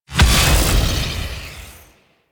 Lev Deactivate; Warframe; Warframes; Trapper

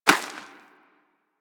Rodent Hop Step Rear Dirt; Characters; Foley; Warframe

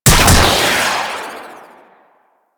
Hand Rocket Launcher Shot; Crp Hand Rocket Launcher

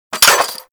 Fire Sweetner, Weapons; Warframe; Gorgon

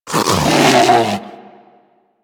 Predator Vocal Alerted; Warframe; Hunting Critters

